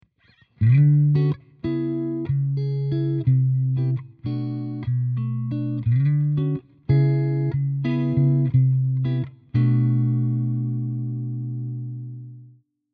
Clean

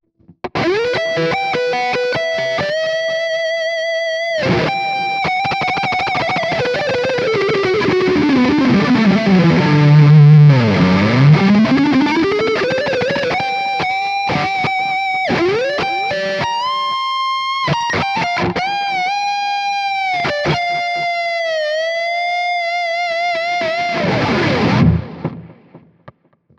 Neural DSP MesaBoogie Liquid Lead

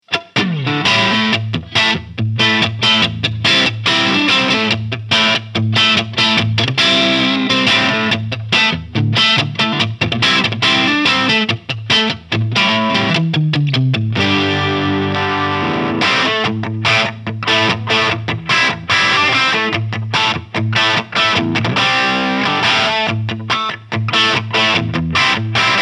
Framus Idolmaker 5R Crunch

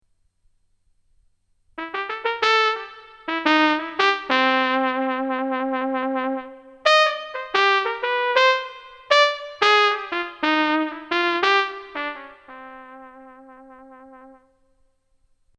DP33 Trumpet

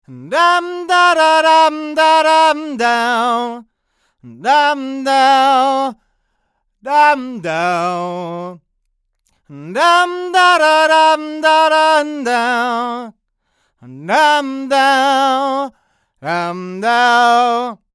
Miktek CV3 near

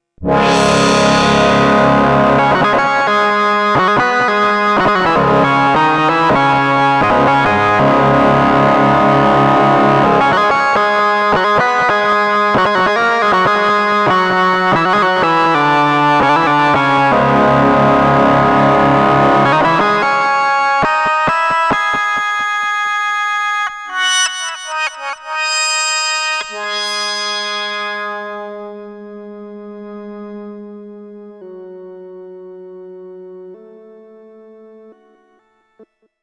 Craft Synth

